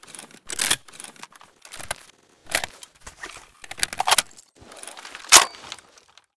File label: ventil load, weapon; metro